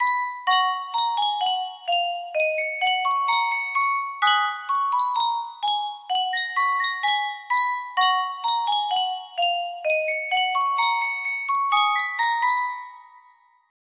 sankyo music box

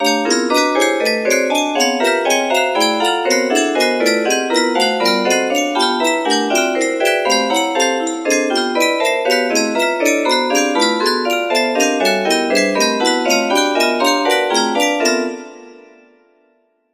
music box

